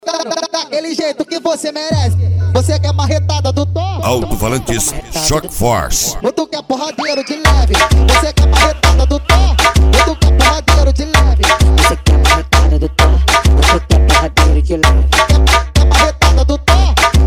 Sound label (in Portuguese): sound car; reboque poseidon sartori